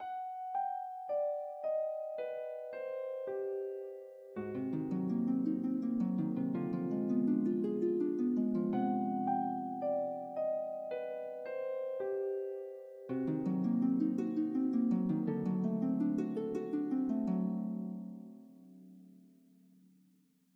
String Wood Melody; Sarco; tintin reporter cigars of the pharaoh

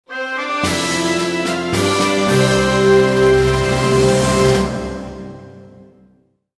endgame victory

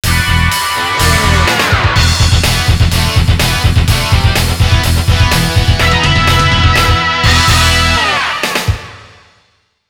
Mission Clear

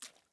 water step

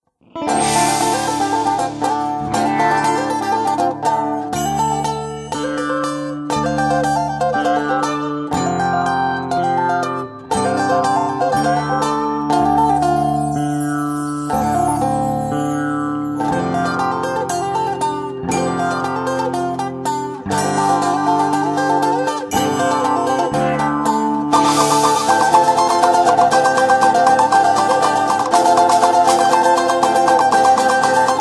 Ethno; Vietcong 2

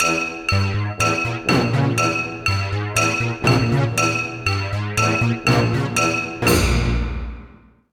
Music Anvil; Legendary Hat

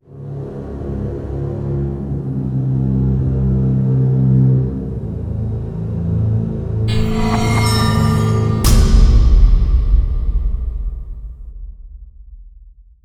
Dark Loop

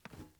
glass jump; teardown; ps5 windows xbox series xs

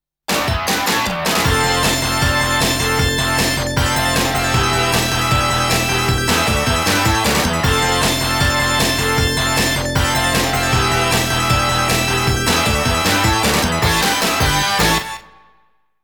Ending Dramatic Version; Aero Fighters; Sonic Wings

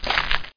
Dirt crunch

